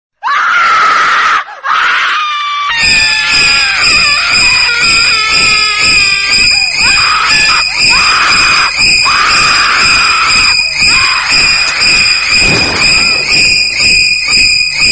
Horror Screaming Ringtone; ringtones

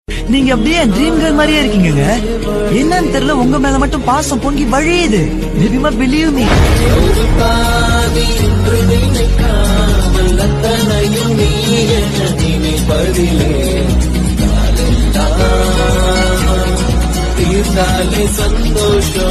Tamil Ringtone

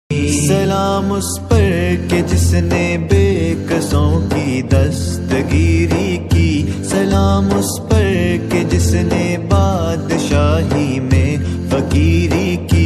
Naat Ringtone

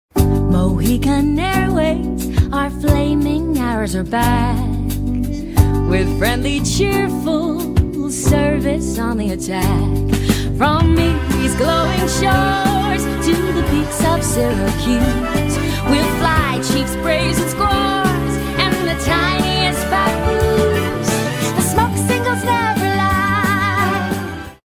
Song Ringtone For Mobile; F Is for Family

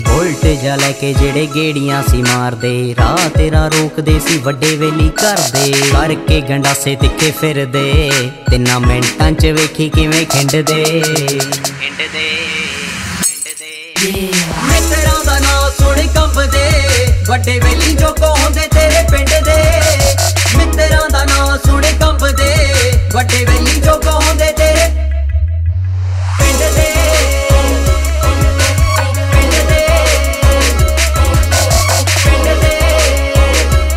New Punjabi Song Ringtone

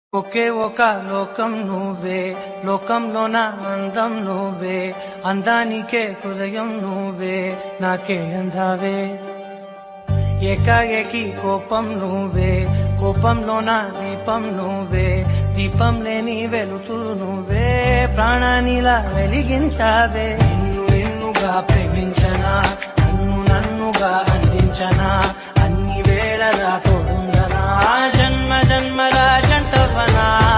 Telugu Song Ringtone

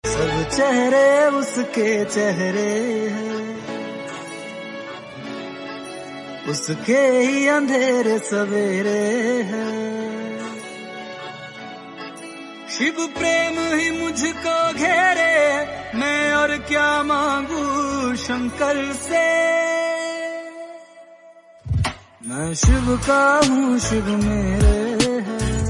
Bhakti Song

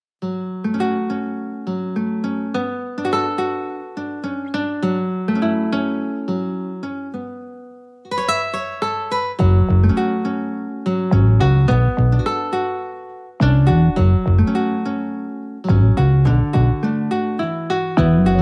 Romantic Music Ringtone

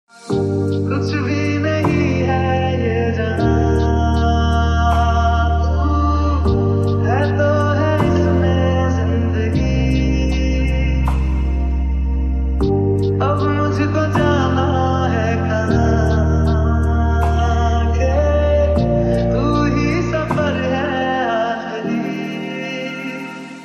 Sad Ringtone

